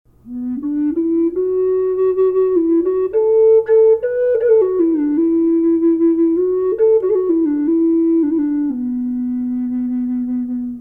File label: low B reverb, flute; sea turtle